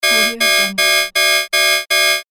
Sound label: panic alarm loop